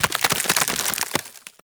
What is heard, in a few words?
Ice Wave Spawn E; Warframe; Corpus; Arachnoid Camper Terra; Abilities